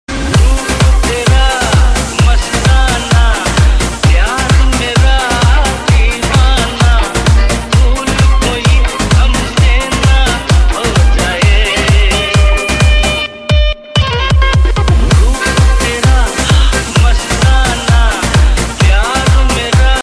remix ringtone

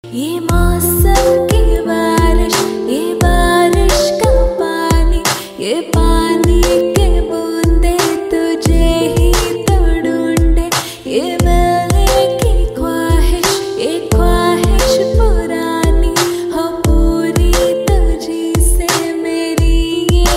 female version; ringtone

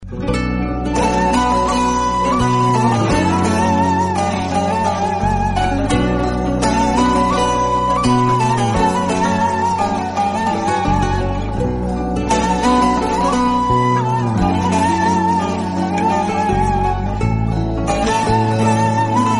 cool soft turkish

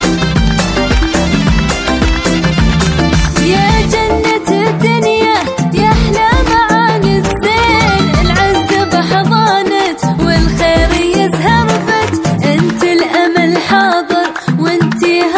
turk song

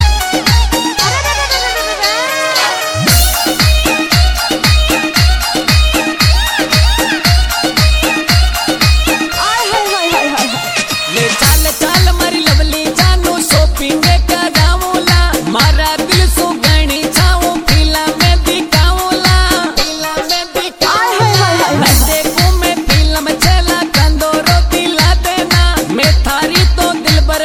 pop rajasthani

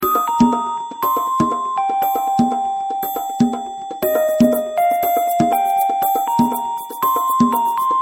chinese music

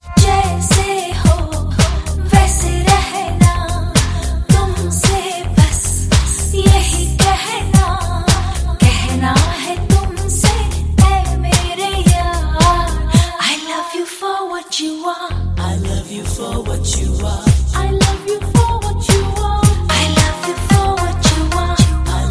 female voice; aap ki khatir 2006

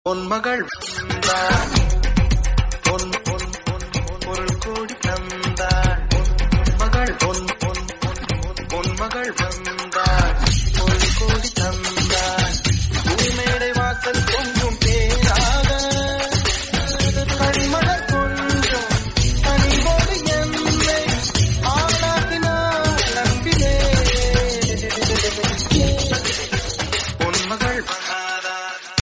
remix Tamil ringtone

